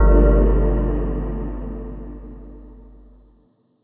chime

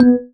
marimba raincoat; ゆめ2っき

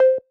marimba; ゆめ2っき

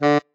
marimba bat; ゆめ2っき

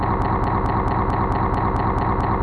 glitch; ゆめ2っき

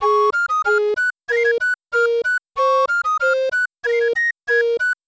bgm recorder; ゆめ2っき

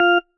marimba tissue; ゆめ2っき

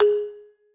marimba; ゆめ2っき

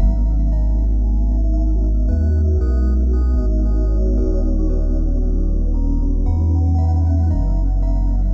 whale song; ゆめ2っき

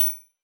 marimba; ゆめ2っき

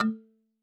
marimba kalimba; ゆめ2っき

